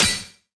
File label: null hit